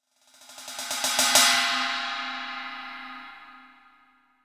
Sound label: ride